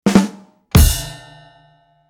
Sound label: Ba dum tss comedy funny punchline; cartoon